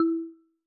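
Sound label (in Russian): marimba sample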